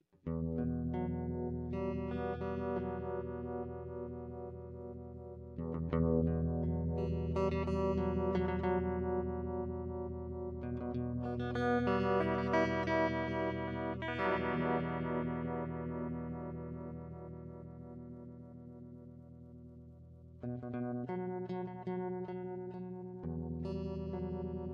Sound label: stereo phasor square